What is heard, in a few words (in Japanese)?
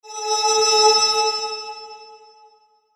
復活魔法; モノラル